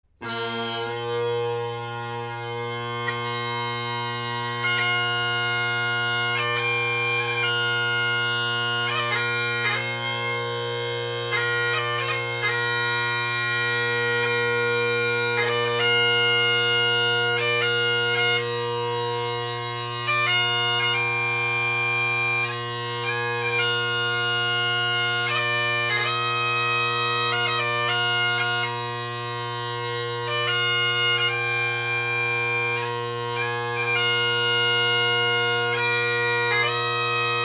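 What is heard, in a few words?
ezee drone, Bagpipe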